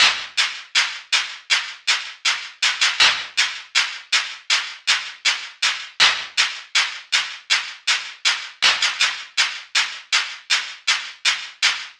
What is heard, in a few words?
metallic loop 160 bpm